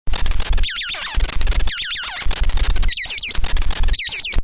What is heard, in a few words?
Loop